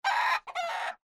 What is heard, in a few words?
rooster bauk